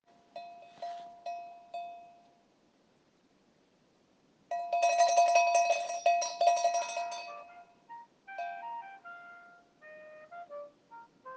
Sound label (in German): Alle Voegel